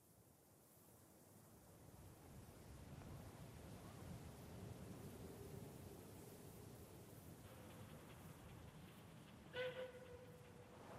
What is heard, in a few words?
Cowboy Ambi; Pitch Black Audio Pong